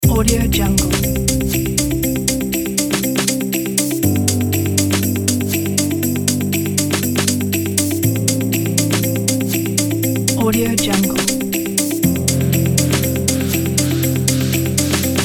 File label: joyful